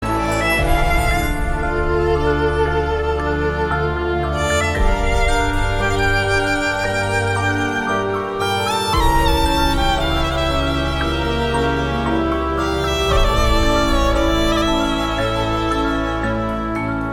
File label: Love BGM